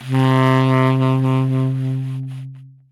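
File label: saxophone tenor C octave0 vibrato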